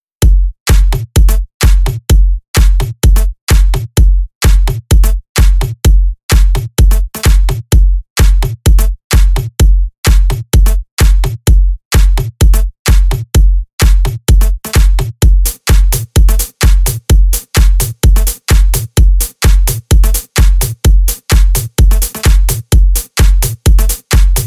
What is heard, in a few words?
8Bit